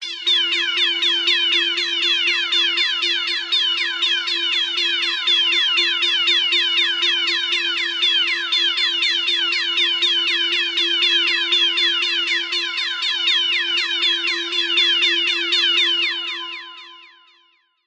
science fiction alarm fm warning emergency beeping med